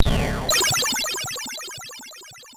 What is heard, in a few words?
Alert; Labyrinth; ringtones